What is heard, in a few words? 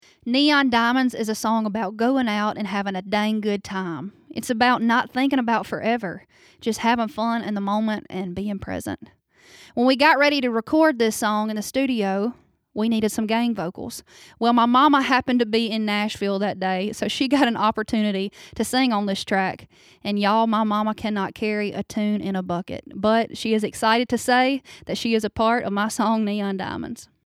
background